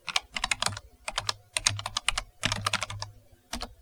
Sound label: Keyboard No Reverb